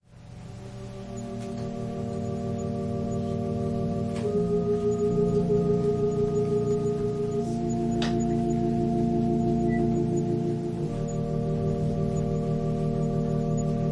Organ prelude

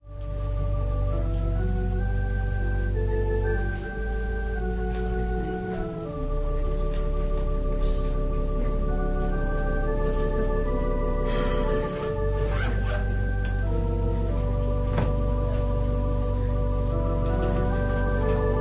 Organ prelude

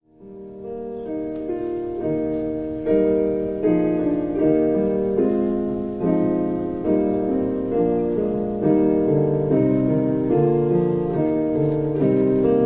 Piano prelude

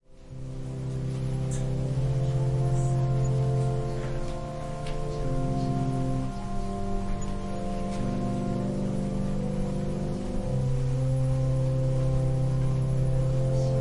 Organ prelude; Responding in faith

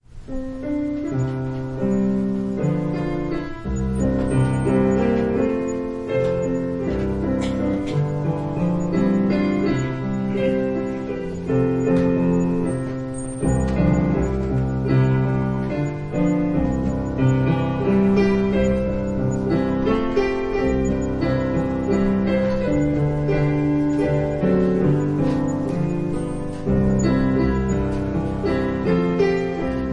Piano prelude

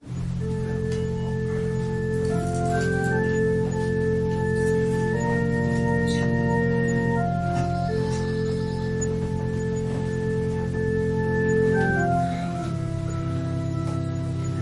Organ prelude

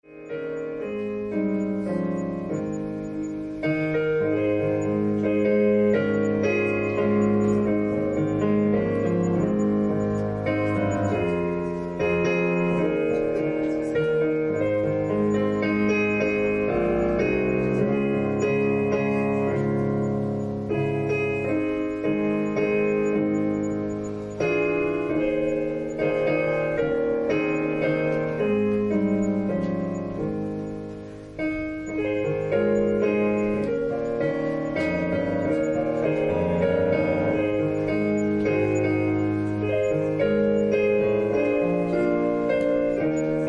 Piano prelude

